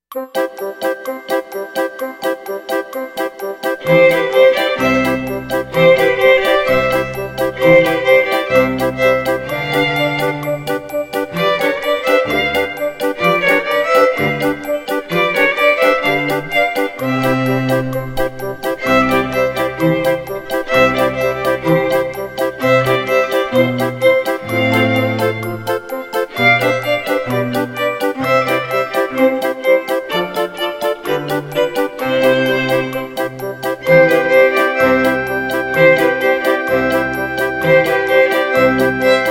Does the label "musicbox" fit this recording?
Yes